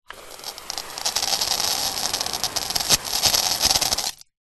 industrial pressure washer spray power off nozzle end; zapsplat